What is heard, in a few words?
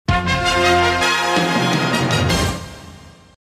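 fanfare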